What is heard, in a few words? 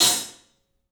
club hat; 3D Sound; 3D Drums